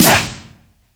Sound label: Scratch Snr; Zero-G; Soundclash